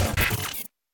Wolf Glitch; Templo Wolf Glitch

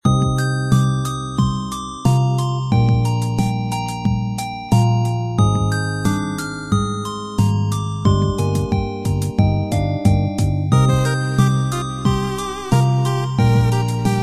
Royal Fountain Calm Melody; gamerip; Spirit Sphere DX; Spsp

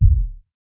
Impact Sub Long; Puddle; puddle plus ios gamerip 2018

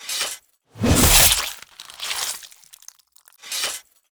kill; Aragami

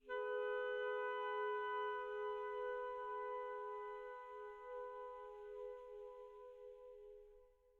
Sky Low Altitude Melody Day B2

